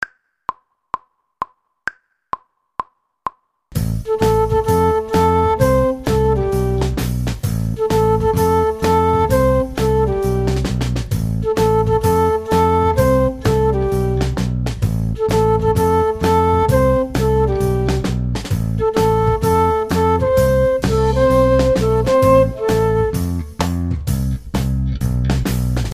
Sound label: flute